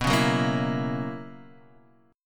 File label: B Major 7th Suspended 2nd Suspended 4th B down Guitar Half Step Down